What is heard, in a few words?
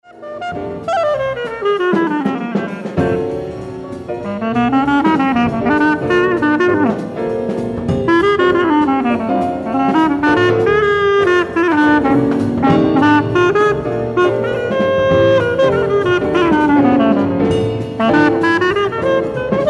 Bell